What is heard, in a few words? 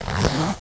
kevlar zipper